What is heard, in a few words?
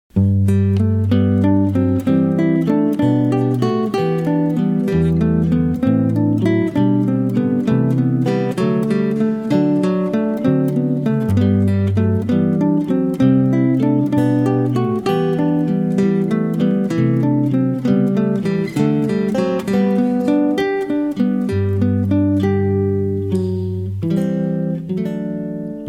Solo Guitar; wedding